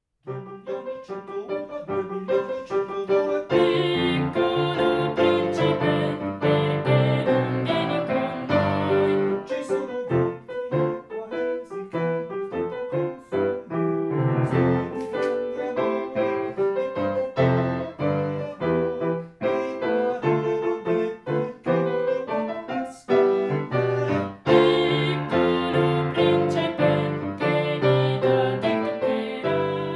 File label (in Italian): CORO DEL PUBBLICO - Lo stormo di uccelli 2° intervento